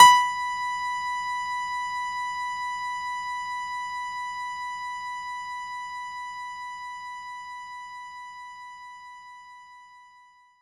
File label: Piano B4; knights try